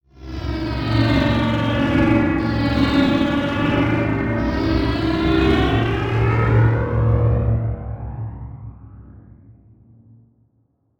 Title Transition Ascend; Production Element Title Transition

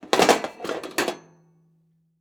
Sports Grill Metal Panel Sliding Out Of Grill

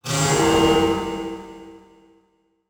Transition Whoosh Alarm; Production Element; Title Transition; AdobeCC

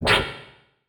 Flash Blurp; Multimedia Internet CD-Rom Flash

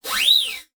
Multimedia Internet CD-Rom Flash Whoosh

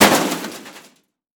Crash Metal Short; Crashes

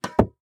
Tennis Racquet Hit Ball; Sports

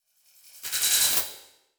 Title Transition Laser Short; Adobe; Production Element

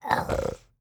Animal Mammal Carnivore Cat Puma Breath; animals; AdobeCC

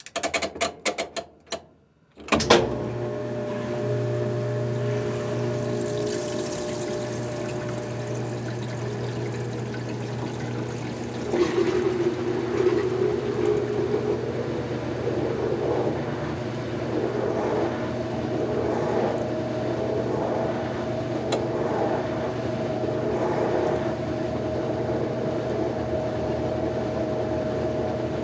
Washing Machine Turn On Spin Shut Off, Household

